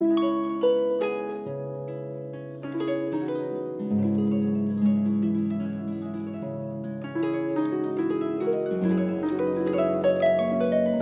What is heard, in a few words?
Inloop muziek Harp